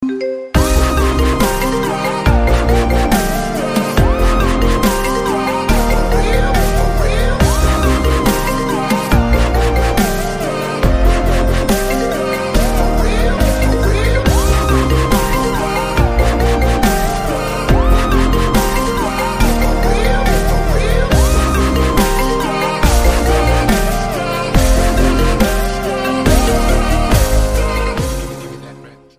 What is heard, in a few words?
Iphone Remix